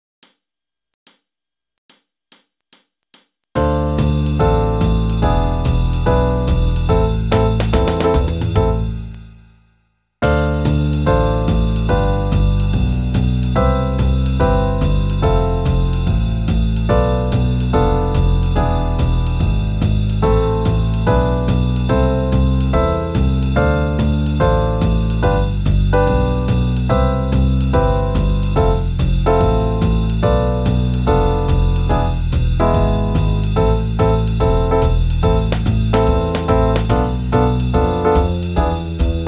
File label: Backing Track C major 144 bpm